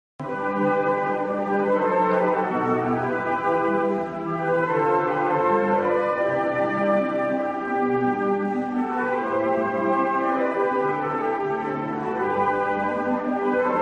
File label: concert, flute; arietta-flute